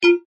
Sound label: Level locked; Secrets of Magic: The Book of Spells